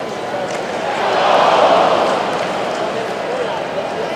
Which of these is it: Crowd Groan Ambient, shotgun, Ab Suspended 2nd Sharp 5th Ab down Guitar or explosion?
Crowd Groan Ambient